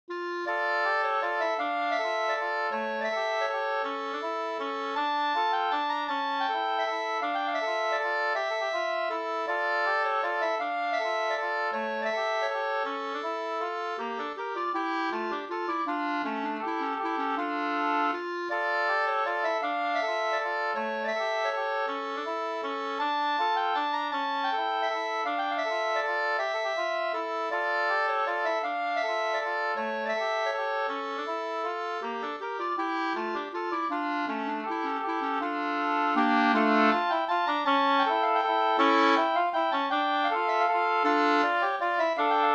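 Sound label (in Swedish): vals, two-part; Bjornvalsen fr Ljusnarsberg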